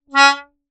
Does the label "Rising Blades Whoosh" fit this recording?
No